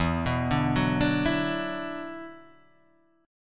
E 7th Suspended 2nd, down

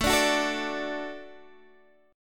D Major, A

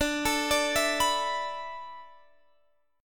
D 6th Suspended 2nd

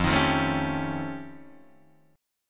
F Augmented 9th F Guitar Open D, chord

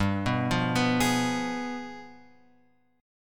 G 7th Flat 9th G; G7b9 Chord {3 2 3 4 X 4} arpeggiated

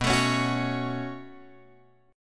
B 7th Suspended 2nd, Guitar, Open F; B7sus2; strummed; JGuitar

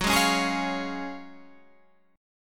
Db Major Gb; strummed

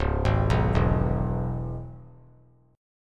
Db Minor 7th Db down, chord; arpeggiated; JGuitar

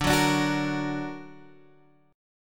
D Augmented, D, down; strummed; chord

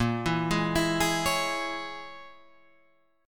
A sharp Minor 7th Flat 5th A sharp

